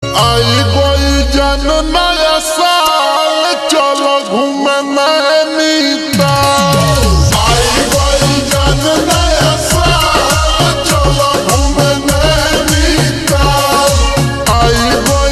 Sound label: Ringtone slowed and reverb